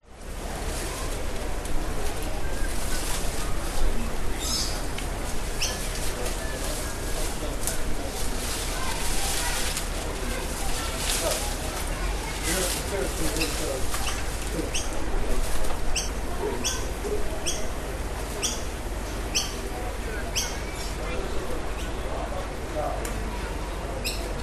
public places zoo australia koala enclosure koala in leaves close by people pass; chat